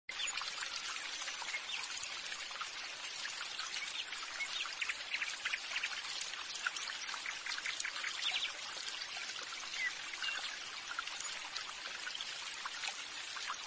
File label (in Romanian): Sunetele naturii